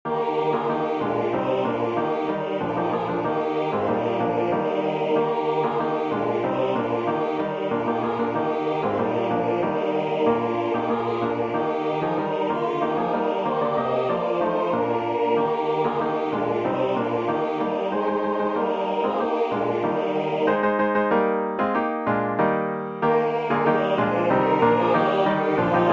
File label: Deck the Halls SATB, Choral Music